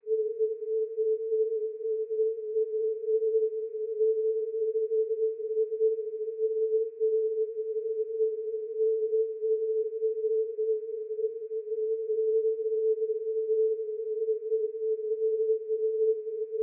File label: no fading cw -18db bw30